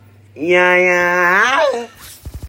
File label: yahh yahh